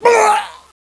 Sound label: pl death, player; sven; bts rc